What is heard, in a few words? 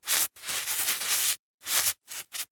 Handwriting Sand; soundbits